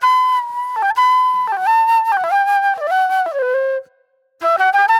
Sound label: Flute